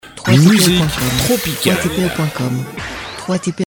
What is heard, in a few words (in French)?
Musique tropical